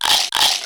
crocodile; PC Play and Learn

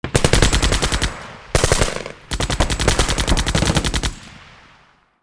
machine gun; Panzer General 3D

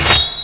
Cling; Bip Boing & autres

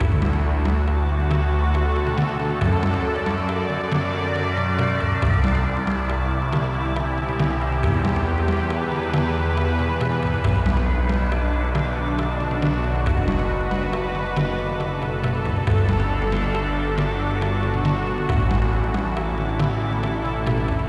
main loop; Understanding McLuhan; Voyager

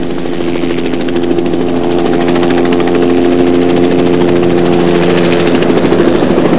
helicopter, transport; Phase 4 - Desktop Video Dreams

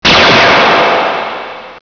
GUN; Death from Above

